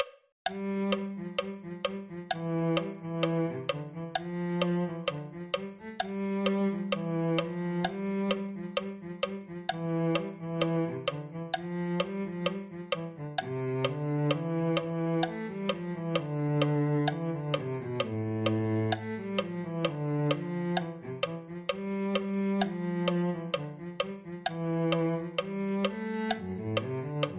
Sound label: cello